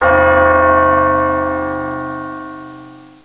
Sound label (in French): son de clocher